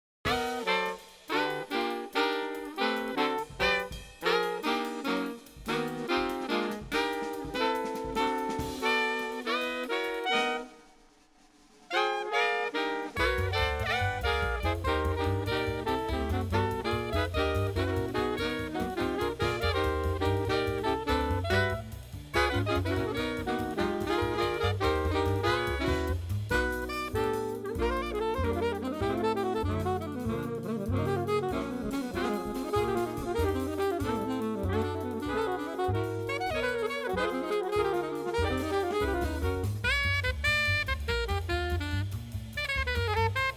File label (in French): sax 4tuor